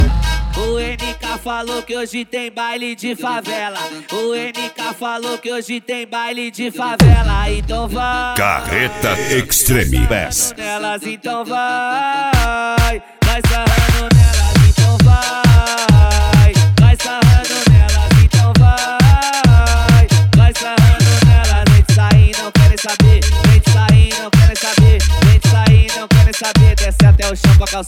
extreme bass; carreta

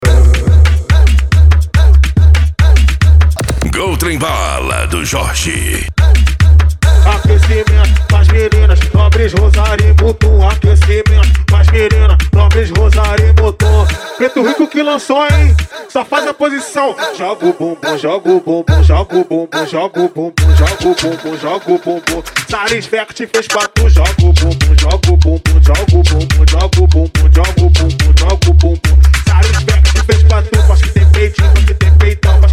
funk mega bass

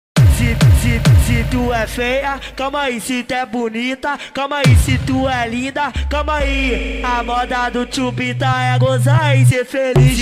funk bass

